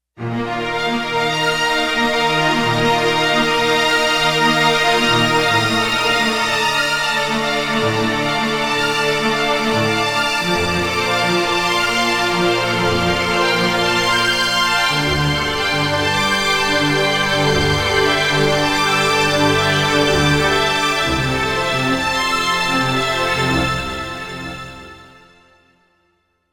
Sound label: ORCH ARPEGIO; Digital Synthesizer